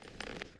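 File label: inventory open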